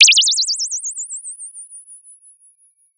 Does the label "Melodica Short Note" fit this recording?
No